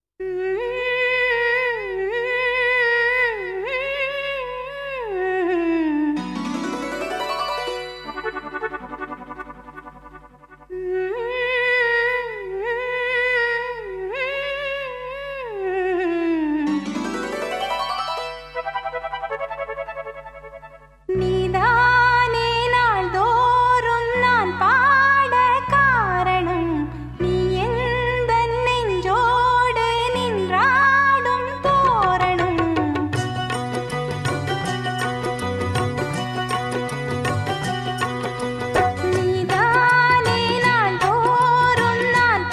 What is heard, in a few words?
Duet, Tamil